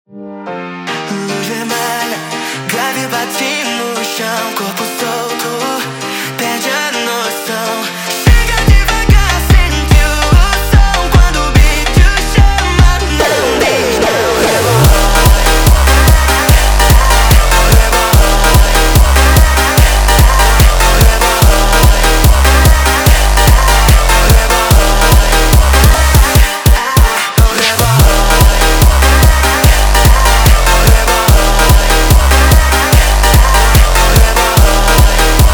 Montagem sped Up

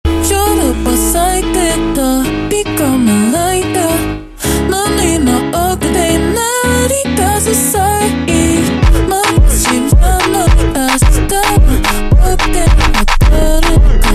Montagem super Slowed

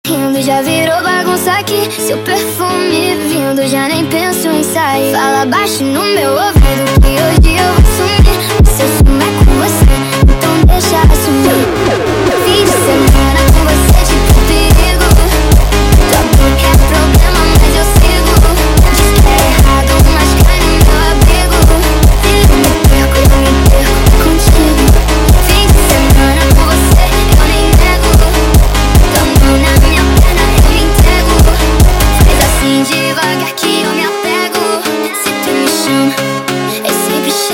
Montagem sped Up

